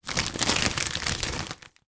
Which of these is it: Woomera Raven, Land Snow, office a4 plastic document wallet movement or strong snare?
office a4 plastic document wallet movement